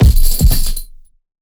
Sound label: Punching Perc, Bag Drop; PROCESSED; 99Sounds; Punching Percussion